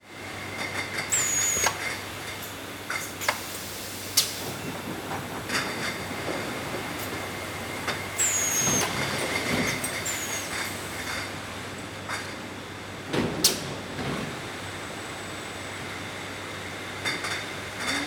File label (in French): convoyeur bouteilles